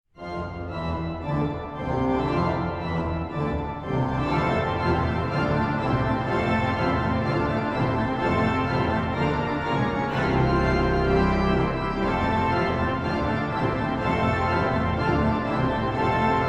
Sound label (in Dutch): Orgelimprovisatie